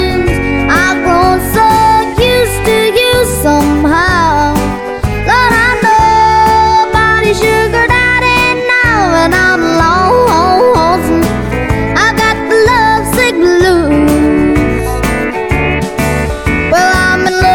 EDM Remix

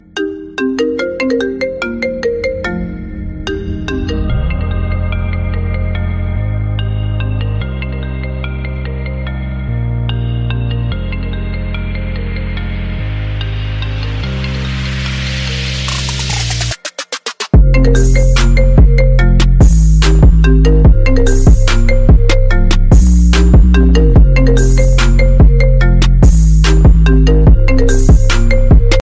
Thunder; iPhone X; Dzwonki na telefon

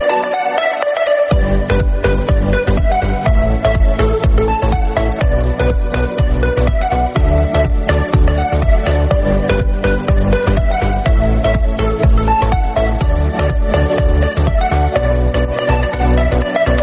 Marimba Remix

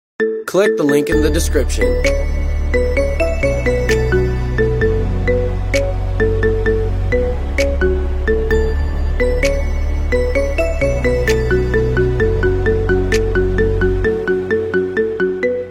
Marimba

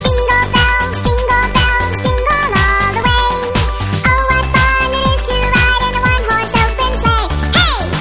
jingle dance

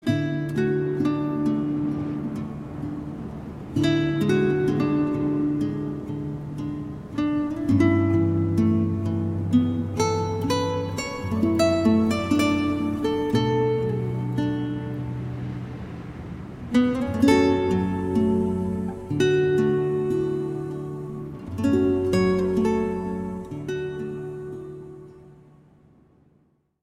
samsung galaxy s22 plus; dzwonki na telefon; Samsung Galaxy S22 Plus Anioły

